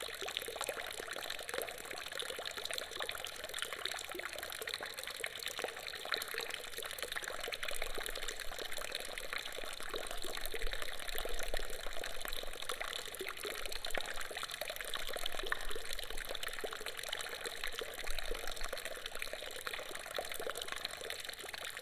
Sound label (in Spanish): fuente con abrevadero